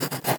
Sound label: fast scribble